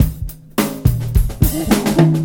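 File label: drum, breakbeat 106; Best Service ProSamples vol.24; AKAI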